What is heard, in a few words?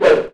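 lamia attack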